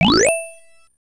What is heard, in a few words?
Coin